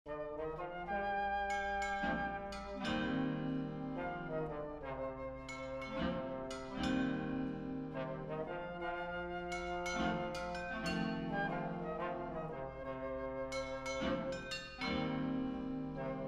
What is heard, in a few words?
brakedrum blues short